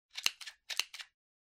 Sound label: Stapler Click